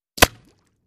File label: Bullet Impacts Water